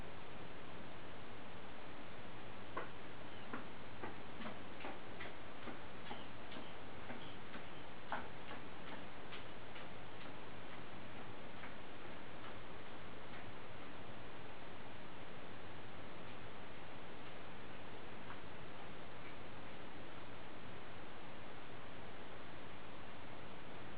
footsteps

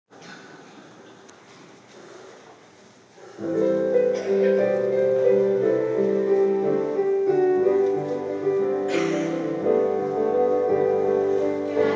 sings gather the people in the choir loft

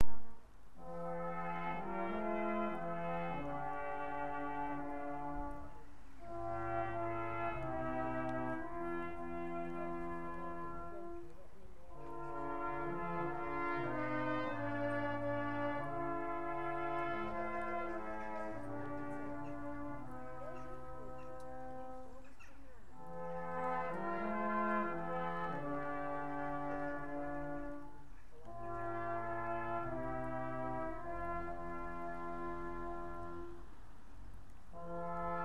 brass trio playing on xmas morning

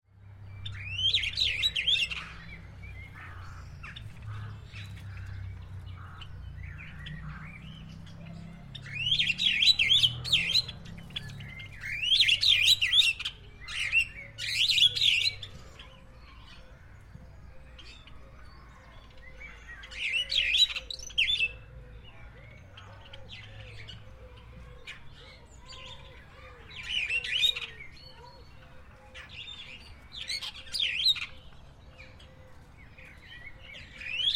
superb starling and java sparrows; at lotherton hall bird gardens

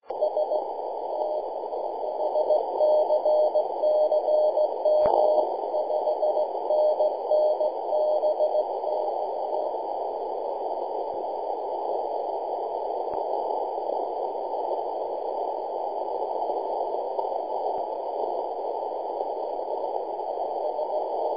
3.515MHz CW

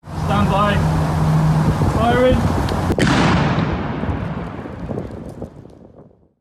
hand grenade detonated; ottershaw

